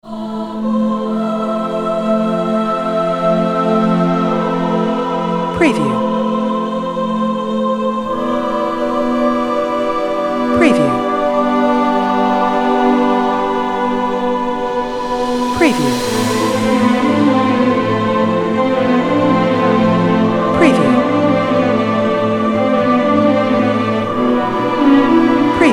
Cinematic Female Voice Suspense Dark Horror Storytelling Soundtrack